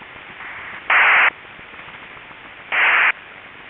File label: STANAG 4538 BW6 WAVEFORM (SERIAL)